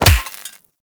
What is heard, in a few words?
Melee Fist Impact Robotic Flesh; Weapons; Impacts; Fists